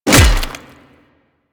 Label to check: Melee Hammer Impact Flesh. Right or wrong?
Right